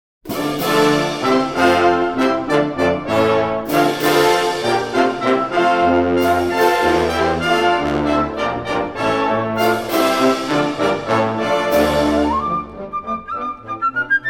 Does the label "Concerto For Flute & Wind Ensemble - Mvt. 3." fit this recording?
Yes